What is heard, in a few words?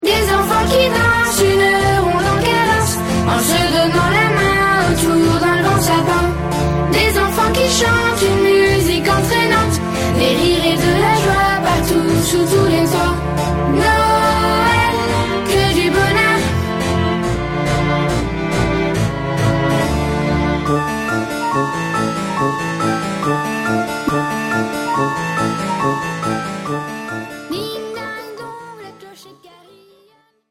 noel acustic